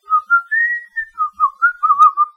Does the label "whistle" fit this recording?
Yes